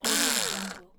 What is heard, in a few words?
rotary power tool motor short